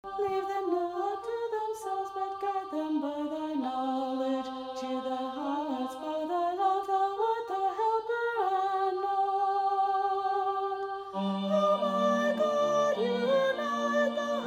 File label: soprano descant, choral music